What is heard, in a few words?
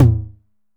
909 Tom, Drum Machines